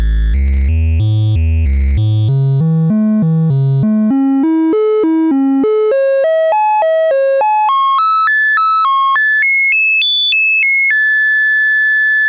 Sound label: 2A03 CV triangle arpeggios